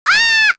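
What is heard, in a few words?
Vox Damage, Korean; voices; heroes; Hanus; kingsraid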